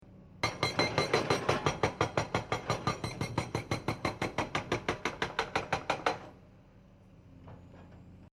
Industrial Quarry Construction Pecker Stone Breaker Digger Breaking Stone Long Rising Pitch; Construction Vehicle